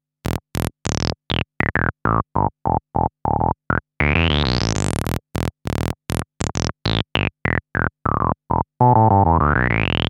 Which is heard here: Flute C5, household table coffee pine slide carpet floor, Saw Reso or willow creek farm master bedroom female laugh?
Saw Reso